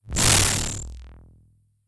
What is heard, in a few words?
spin trap; dos lem